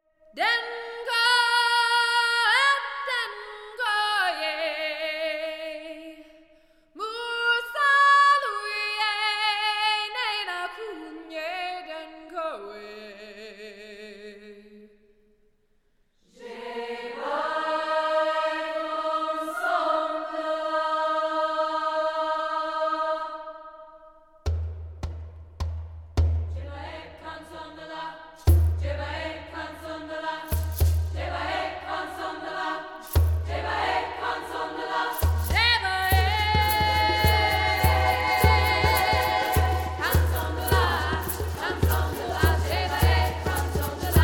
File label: Womens Choir; Denko